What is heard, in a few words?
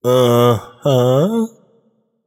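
nonverbal agree; smite; voicepack; merlin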